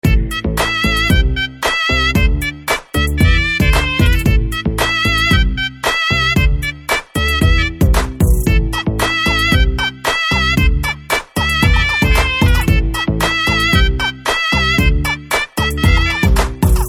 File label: BGM Telugu Ringtone